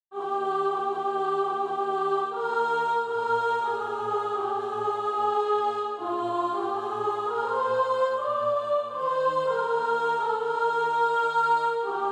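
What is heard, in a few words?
soprano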